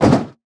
Jump Metal Land

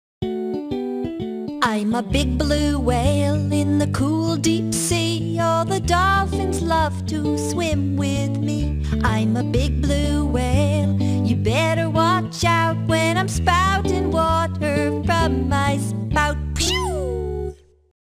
Whale Song

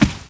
walk wood; gamerip

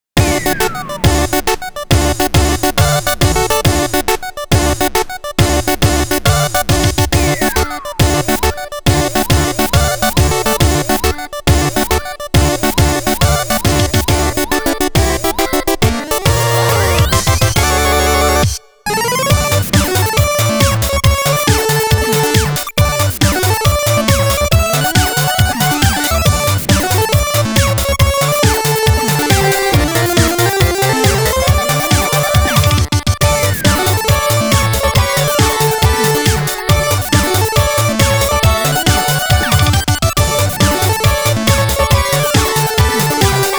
Fanfare (SEXY-SYNTHESIZER REMIX)

